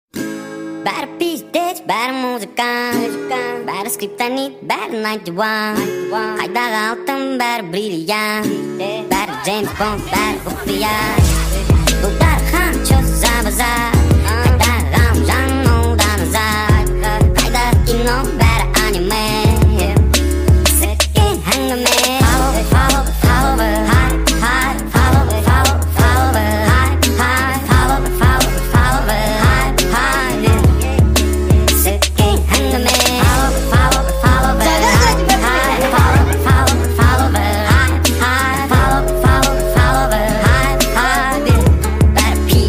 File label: speed up remix